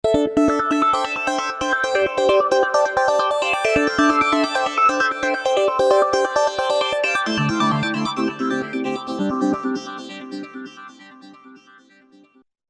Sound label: Roland V-Synth; Factory Patches